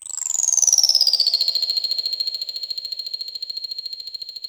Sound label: clicker C4